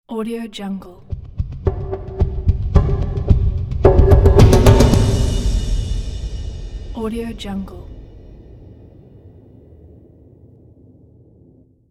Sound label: percussion logo